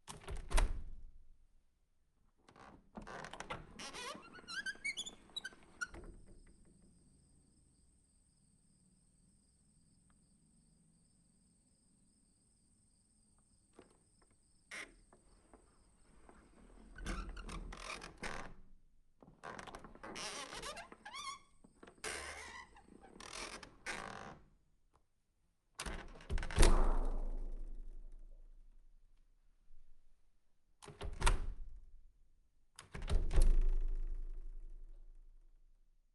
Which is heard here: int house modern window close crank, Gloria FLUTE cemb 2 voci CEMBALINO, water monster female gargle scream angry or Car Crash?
int house modern window close crank